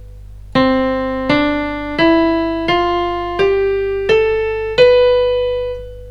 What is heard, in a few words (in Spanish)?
ESCALA MUSICAL